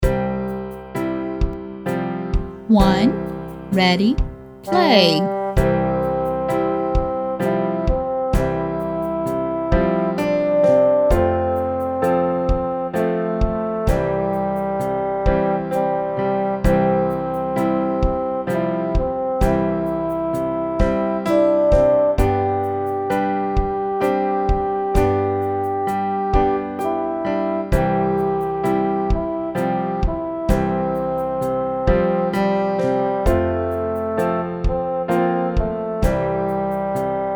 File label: Amazing Grace SLOW Tempo WITH INTRO, ukulele; Rainbow Ukulele